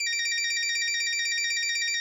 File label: Ring